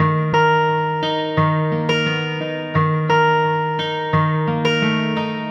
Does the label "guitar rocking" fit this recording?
Yes